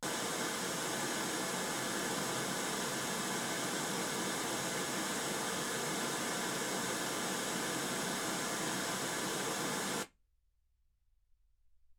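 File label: white gaussian noise 48kHz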